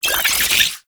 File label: Brood Spider Vocal; Warframe; Oraxia